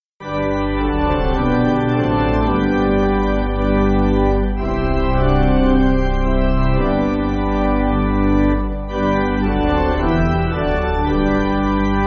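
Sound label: Pipe Organ, Backing Files for Churches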